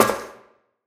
metal step; Golden Crayon Sounds

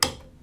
light switch; Golden Crayon Sounds

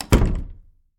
door close; Golden Crayon Sounds

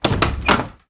door open; Golden Crayon Sounds

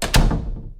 door close; Golden Crayon Sounds

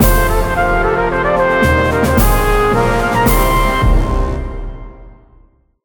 obtain stadium key; Manamon Music; Manamon 2

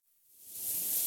Keyboard Up; Business skieme Beta 1

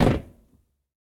metal land; Golden Crayon Sounds

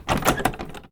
door loop; Golden Crayon Sounds

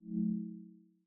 navigation pop, iOS Sounds

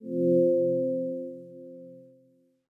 latency response; iOS 27

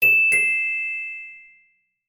doorbell; Golden Crayon Sounds

